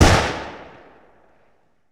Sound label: Pistol Shot With Echo; Sony Pictures Sound Effects Series Volumes 1-10; Sony Creative Software